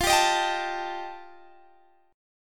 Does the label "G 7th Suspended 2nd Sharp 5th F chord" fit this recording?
Yes